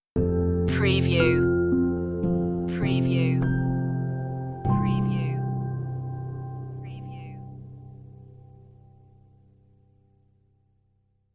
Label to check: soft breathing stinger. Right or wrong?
Right